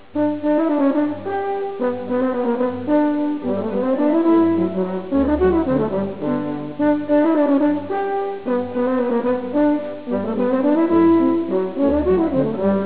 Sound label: brassy nite, horn